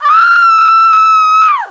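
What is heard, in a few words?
Scream, female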